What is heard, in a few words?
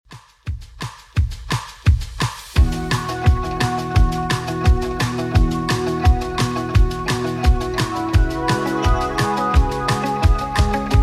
80s Redrum, 172 bpm